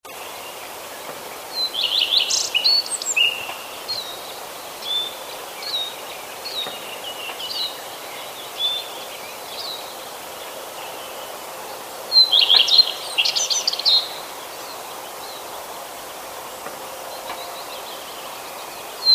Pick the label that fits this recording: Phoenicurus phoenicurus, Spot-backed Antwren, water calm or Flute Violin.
Phoenicurus phoenicurus